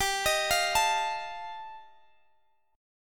G 7th Suspended 2nd Sharp 5th, G, Guitar, Standard; G7sus2#5 arpeggiated